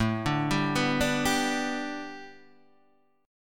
A 9th Suspended 4th Guitar Standard, chord; A9sus4; arpeggiated; JGuitar

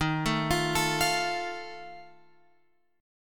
D sharp Suspended 2nd Flat 5th Guitar Open F; arpeggiated; chord; x,10,9,12,12,12; JGuitar

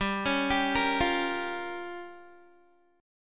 G Minor 7th Flat 5th, Guitar; arpeggiated; 4E,4A,5D,5G,5C,6F; Gm7b5; 15,16,17,15,17,x

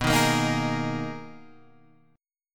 B Major 13th B Guitar Open G

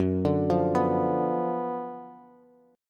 Gb Major 7th Suspended 4th Sharp 5th, Bass; GbM7sus4#5 arpeggiated; JGuitar

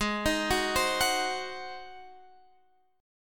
G sharp 7th

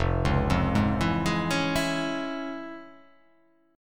F Minor Major 7th Sharp 5th, chord; 1,4,3,1,4,3,3,1

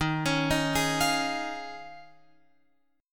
Eb 9th Suspended 4th, Guitar, Open F; Eb9sus4; arpeggiated

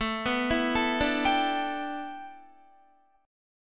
A 7th Suspended 2nd Suspended 4th Guitar

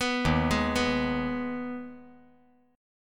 D sharp Suspended 4th Sharp 5th

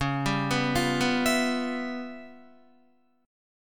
Db 7th Sharp 9th Flat 5th Db down Guitar; Db7#9b5 arpeggiated; JGuitar

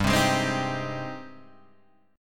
Ab Major 7th, chord

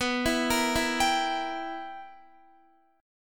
B Major 7th Suspended 4th Sharp 5th Guitar, chord; BM7sus4#5; arpeggiated; x,14,16,15,16,15

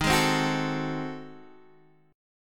Ab 7th Flat 9th Eb, chord, guitar

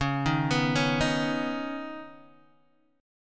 C sharp 7th Suspended 2nd, Guitar DADGAD

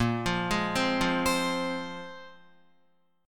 Bb 7th Suspended 2nd, Guitar, Bb7sus2 chord; 5,8,8,5,5,8; 4F,4A,5C,5G,5C,6E; Bb7sus2 arpeggiated; JGuitar

